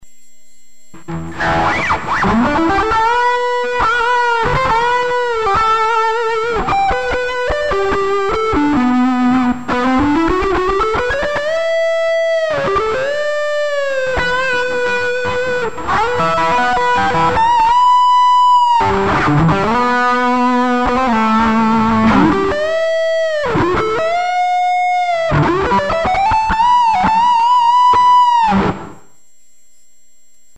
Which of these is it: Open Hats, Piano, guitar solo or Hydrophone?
guitar solo